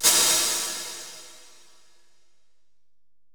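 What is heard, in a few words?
909 pedal hi hat; 480L; Wizoo; Platinum 24 Electronic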